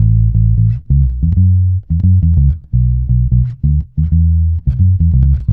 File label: A, dub, bass; Spectrasonics; Bass Legends CD 1; JOY DUB